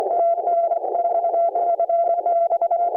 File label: FT-991